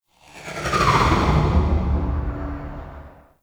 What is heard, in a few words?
Exterior Passby; Ambience; Warframe; Corpus Railjack